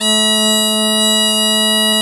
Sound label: BP BASS B2, Celtic Flavours; USB Soundscan vol.42 - Celtic Flavours; AKAI